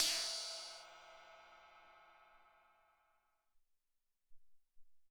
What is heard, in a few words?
FX Ride, Alesis SR-18